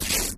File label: paper tear